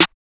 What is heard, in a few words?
SNARE, Snare